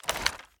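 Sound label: sniper drop quick, reloads; gmod; iw8; xmike109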